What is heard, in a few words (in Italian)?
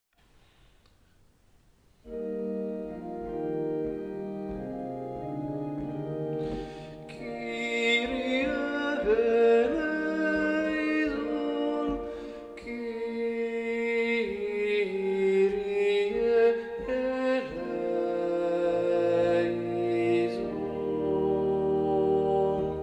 Kyrie. Soprani e tenori; Coro Isola del Giglio; Messa di Bottazzo